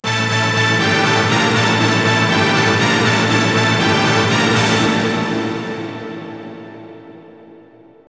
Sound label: Str G#